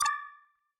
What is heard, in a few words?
spin button; astro Legends Lyra and Erion Desktop; Foxium